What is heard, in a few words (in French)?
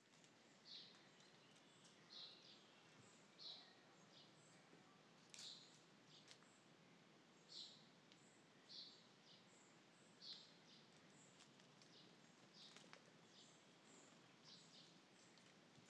Très Ancienne Carrière, Oiseaux Long Et Brindilles